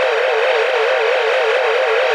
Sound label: Sci-fi Hover Bot Loop; SFX Kit; Sci-Fi Loops